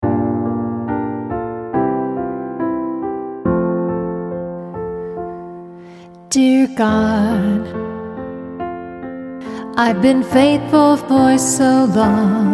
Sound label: Female Vocal